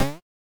wall jump; Robot 64

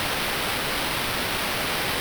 Sliding; Robot 64

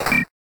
Damage; Robot 64

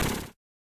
Box Break; Robot 64

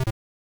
Cancel; Robot 64

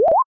Level Creator Create; Robot 64

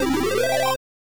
Powerup; Robot 64

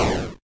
Explosion; Robot 64

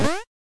jump; Robot 64

